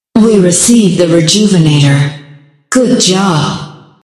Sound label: music stinger rejuv won; killstreak music